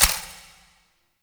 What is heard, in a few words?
Countdown Tick; UI; Undercroft; Warframe; Duviri